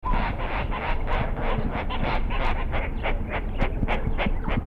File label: coracias caudatus, birds calls; coraciidae